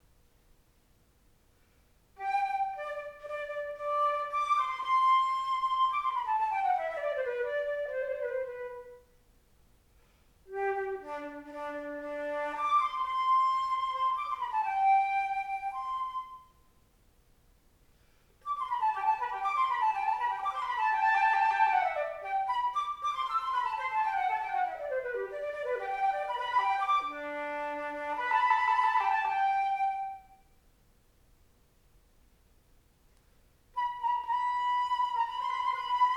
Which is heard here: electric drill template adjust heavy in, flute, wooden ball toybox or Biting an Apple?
flute